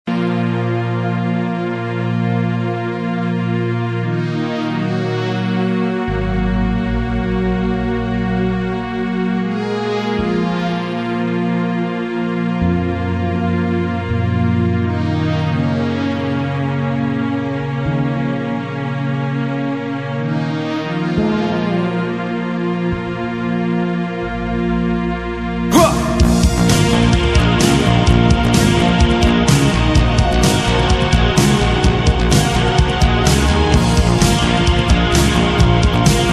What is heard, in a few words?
crying